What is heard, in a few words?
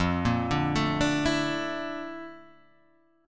Bb Major Sharp 11th F, chord